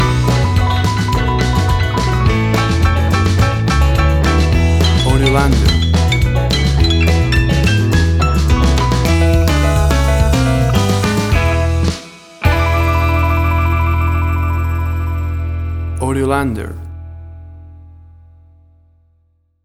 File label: 1950s Rock and Roll Country Shuffle; 15 SEC MIX